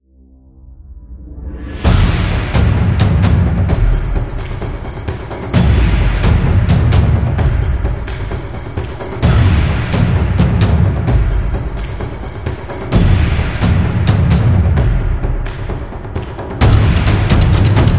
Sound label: The Taiko